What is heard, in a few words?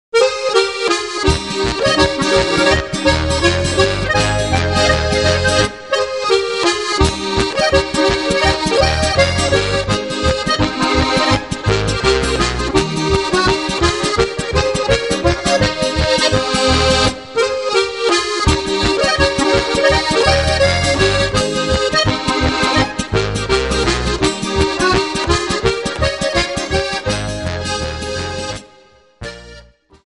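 tournee polka, Steirische Harmonika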